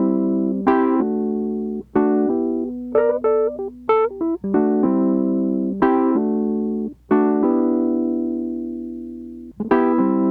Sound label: Mixed Berry Jam Wurly, 70s Analog Funk; Sonic Foundry (Sony Creative Software) - What it Is! 70s Analog Funk